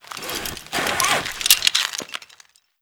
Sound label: rappel liftrope clickin